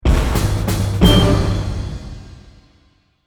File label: Ocean Battle ~ Finish; Rune Factory Tides of Destiny